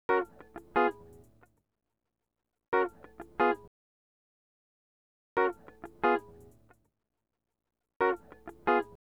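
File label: guitar, G#, Hip Hop & RnB; ProSamples vol.34 - Hip Hop & RnB 3; Okey Dokey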